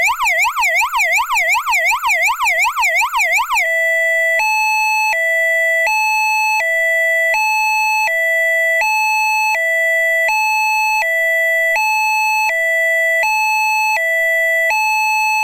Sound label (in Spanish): Tono Audiopenetrante bitonal